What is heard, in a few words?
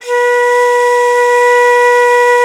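soprano bansuri, World Winds; E-MU Producer Series Vol. 7 – Old World Instruments; World Combos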